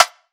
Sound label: Rimshot Snare; Drum One Shots; Snares; Cymatics; Cobra Hip Hop Sample Pack